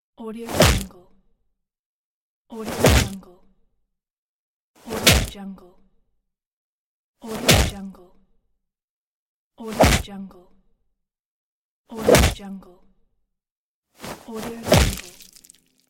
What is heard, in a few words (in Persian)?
مشت زدن